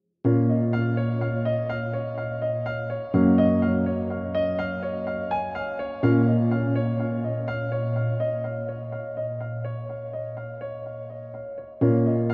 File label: Cover Song, Sinhala